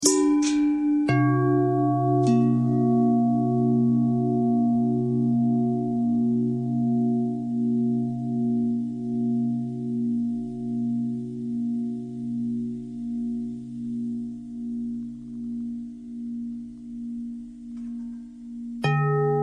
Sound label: bell long bell thread noise, bells